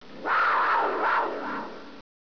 western cougar distant

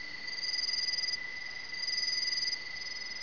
western crickets

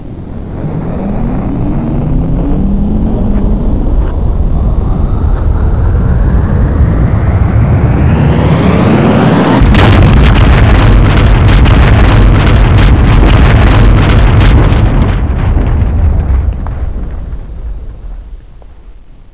bang; colonyx

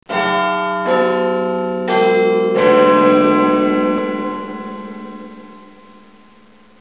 wchime; vox

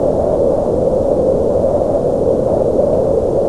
wind

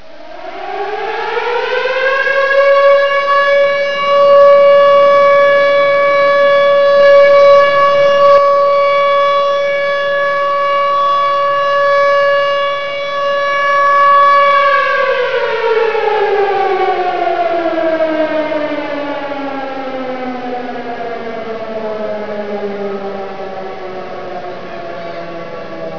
party sound

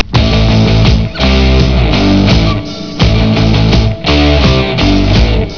jam

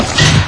iris slam; stargate

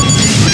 computer; stargate

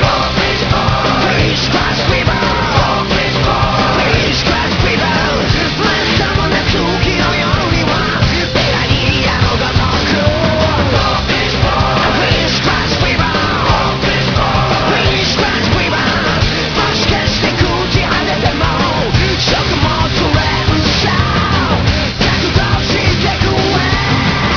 music

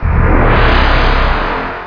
damage

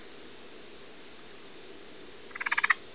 frogcri

